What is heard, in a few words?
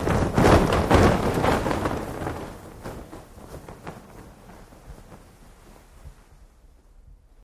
Flag Flap Shake Parachute